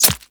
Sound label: Player Arrow Impact Flesh; Warframe